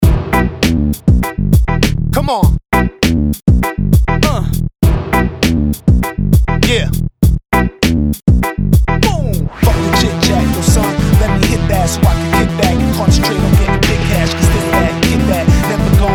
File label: hiphop